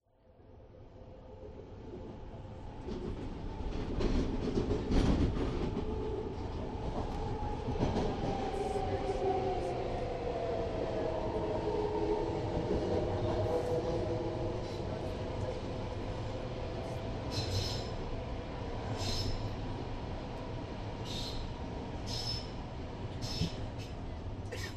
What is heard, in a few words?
riding bart; white noise lounge